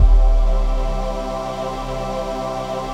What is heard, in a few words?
VOICE PAD; Best Service; XX Large; Pads